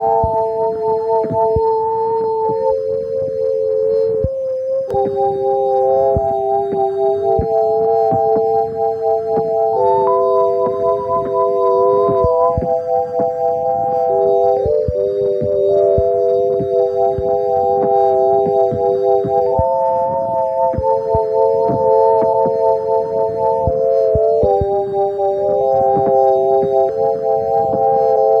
Casio Whistle Ringtone; Katana Zero

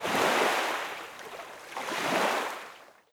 Enter Water; Legend of Keepers (gamerip) (2019)

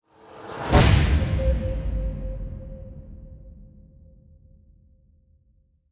Music Trans Raceendsweetener

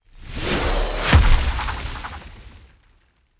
Earth Quag

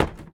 Footstep Plastic Tech

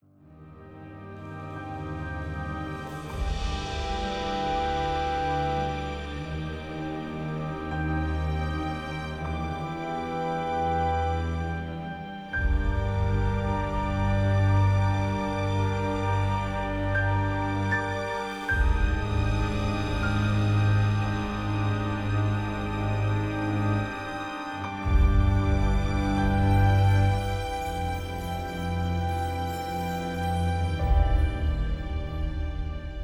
town music

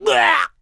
Enemy Generic Human Death Crushed

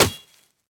Tree Sword Hit

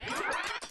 Armadillo Roll Charge; unsighted; enemies

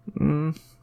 chorus really sad

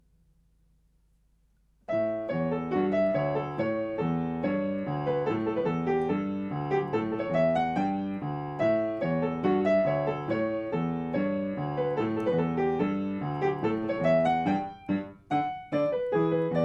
Samba, Piano

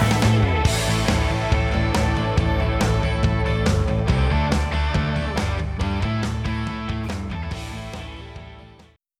Intro Music; wheel of fortune

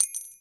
Coin Bag Ring Gemstone Item

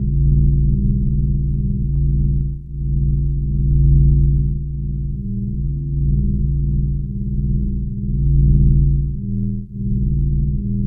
Engine Hum; Zephyr

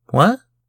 Chorus confuse

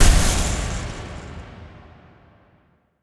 Armour Burst

